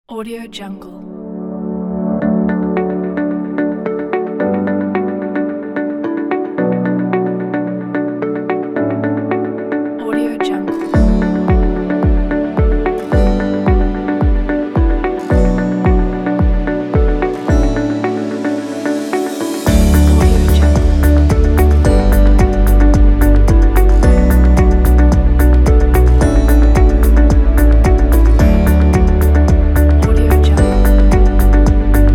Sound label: uplifting and inspirational summer corporate